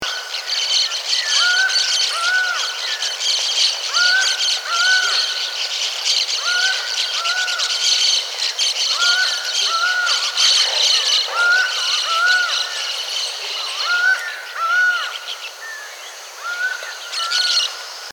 cobalt winged parakeet

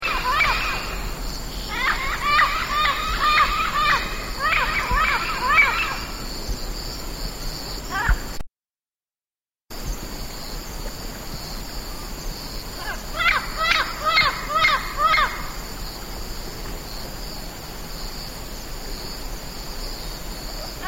yellow crowned parrot

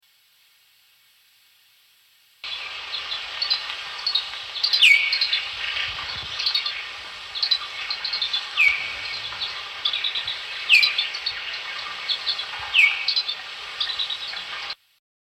vietnam greenfinch

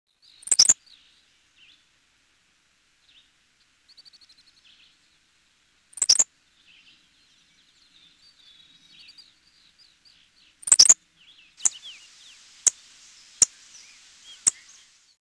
henslows sparrow

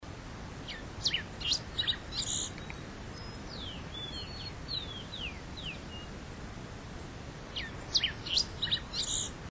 rufous breasted warbling finch

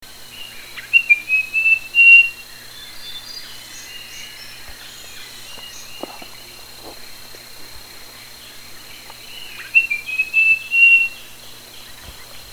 blue shouldered robin chat

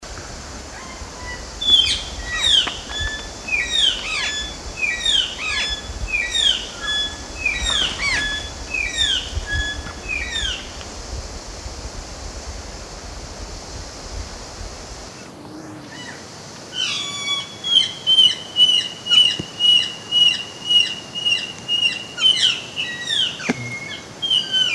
singing parrot

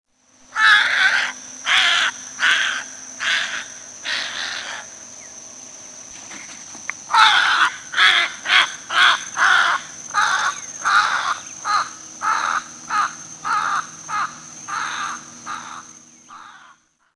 little blue heron

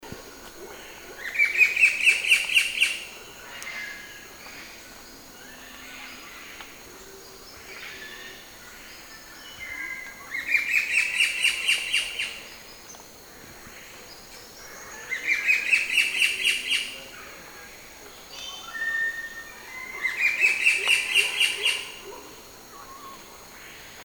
buff breasted paradise kingfisher

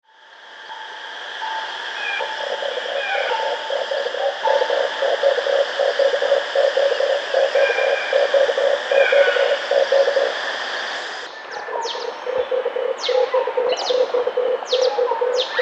red collared dove

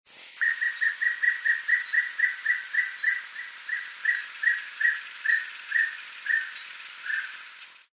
lesser ground cuckoo

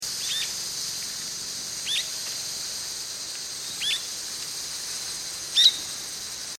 striated swallow

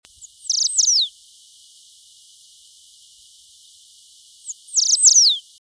band tailed sierra finch

